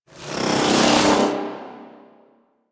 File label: Bow Draw; Warframe; The New War; Quests; Weapons; Omicrus